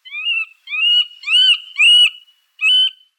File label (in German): Mittelspecht Picoides medius